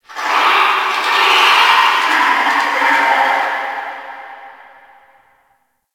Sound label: Ice Critter Distant; Warframe; Corpus Ice Planet